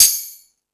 Hip Hop, Drum Machines

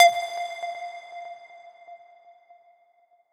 lead clink F; leads

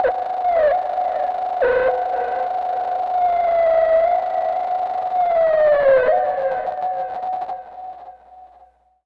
synth, Fmaj, Hardcore Breakbeat; Big Fish Audio; Hardcore Breakbeat Action

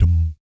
BS DMM, Vocal Planet Jazz & FX; ILIO; BASS DUMMS

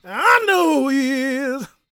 GOSP MALE, Vocal Gospel; Vocal Planet Vol1 Gospel

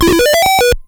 jump, ATARI

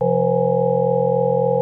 TALK LEAD, SYNTHS; Kid Nepro; Elite

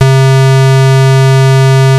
SYN WAVE; Back In Time Records; Big Bang

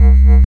mod bass, Mod Drums; neuro; Sinistarr

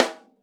Cliks Snaps

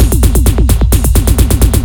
Beat, Drums & Percussion; Electrocution; Beats

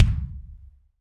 BD AMBIENT, Real Mega Drums; Best Service

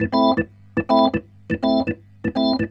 Roots Reggae, B-3; Sounds Good - Roots Reggae

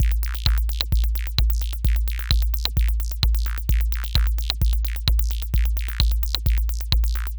Anabeat Mix, Beats; Sonic Foundry (Sony Creative Software); Prototechno

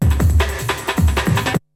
BREAKBEATS, TECHNO

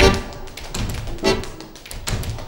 ACCORDEON

